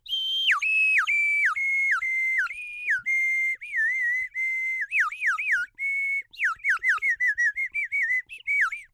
Bird whistle, clay, painted, water, airy, wispy, phrase, descending, lingering, wandering, long, water; water plops; Eiravaein Works; Vaeyan IV